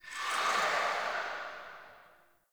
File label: Cavernous Water Lap Rock Distant; Ambience; Dungeons; Under City